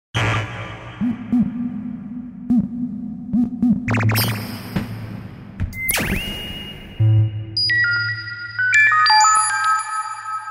SHORT NOISES, Twisted Special FX; Universal Sound Bank; Soundscan 14

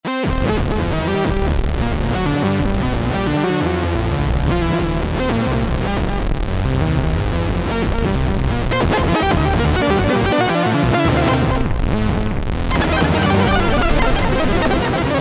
Sample & Hold - Modulation to Oscillator; Greytsounds Philip Wolfe's Rock Keyboards

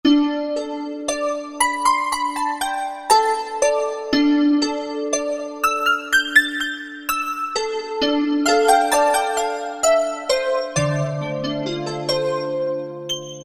Bells, Yamaha TG500

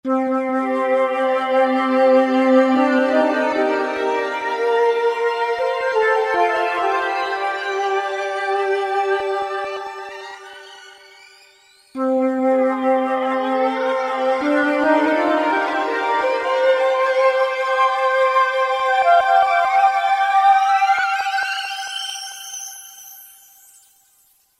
Cosmic Flute, Roland SR-JV80-09; Roland SR-JV80-09 Session

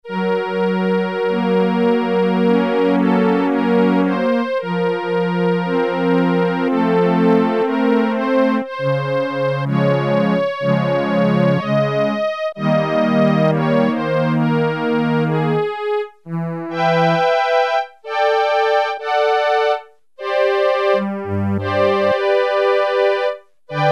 STRING ENS, Casio CZ-1000

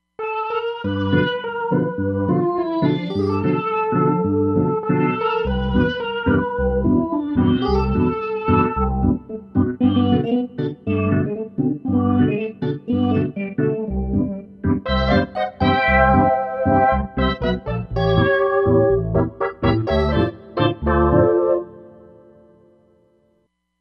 Phono Organ, Roland Fantom XR

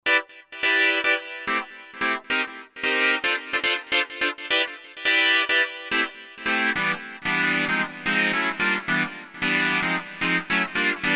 Roland JV-2080 House Chord

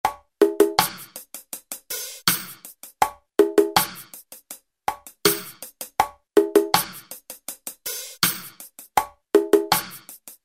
New Age, Alesis SR-16, Preset Patterns

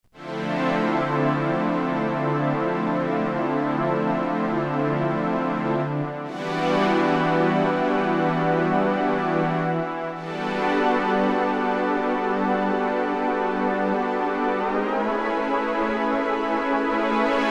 Big Soft Pad, Novation XioSynth 25

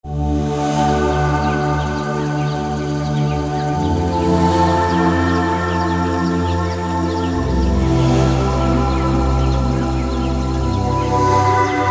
Nature Pad, synth

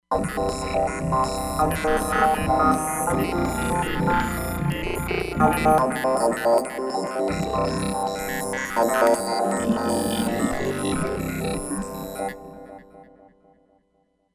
Cross Talk, Roland Fantom XR

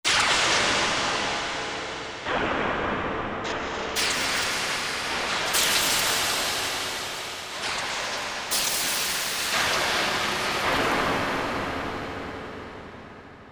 Sand Storm, Korg MSC-14S Sound Effects

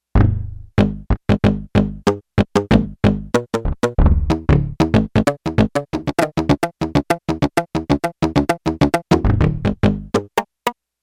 Gated FX

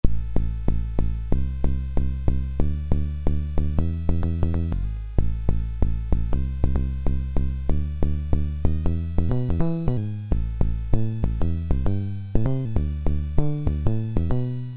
Electric Bass Guitar, Realistic MG-1 Concertmate; audio examples from the Patch Diagrams

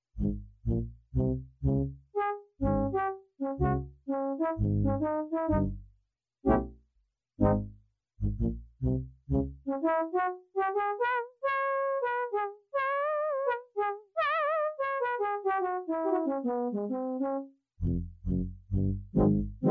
BRASS, Yamaha DX7

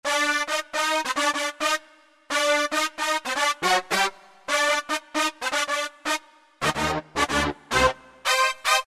Toms Brass, Roland SR-JV80-19 House Collection

